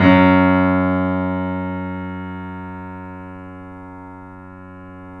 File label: F# -R, The Piano; InVision Interactive - The Piano